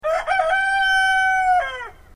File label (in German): Hahnenschrei laut